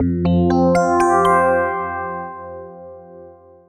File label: raindrops, DX; Wizoo - Powered DX